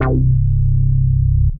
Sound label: Calliope, Basses; Poli; Mischief